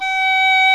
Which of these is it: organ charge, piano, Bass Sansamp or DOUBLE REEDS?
DOUBLE REEDS